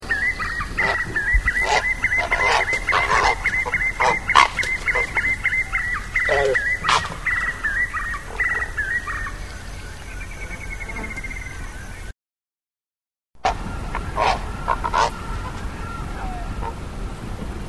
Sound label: cocoi heron